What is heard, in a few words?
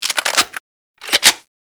Weapon Test Reload; Warframe; Caliber Chicks Minigame